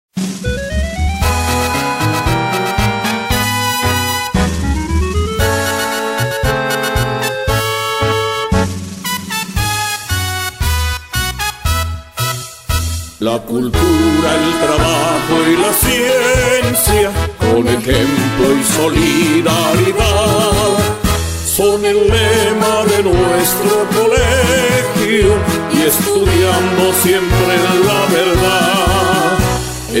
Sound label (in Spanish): Himno Colegio; himnos